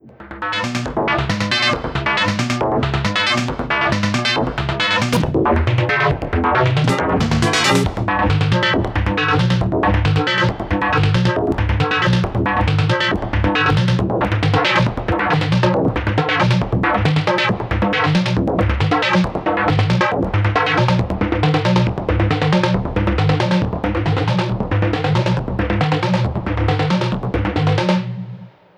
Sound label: FM Percussion; flame2; FM Kosmos